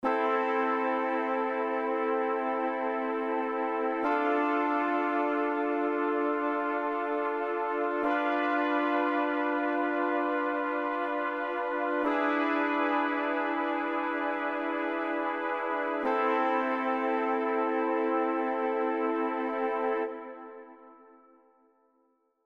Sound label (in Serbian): akordi limeni duvaci mol